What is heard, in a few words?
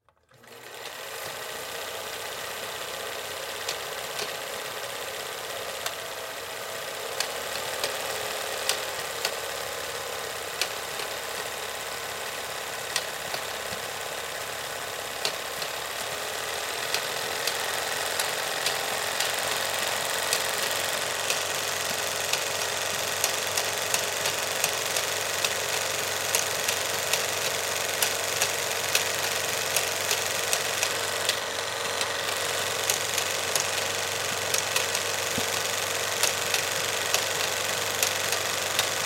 Film Projector, Film Projector Reel To Reel 16mm Full On Off Long